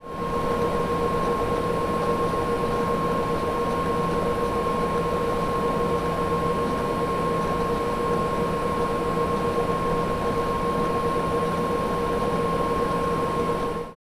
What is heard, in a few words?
Powerful Computer Industrial